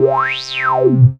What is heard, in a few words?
Dance Synths; Best Service ProSamples vol.37 - Dance Synths; Wasps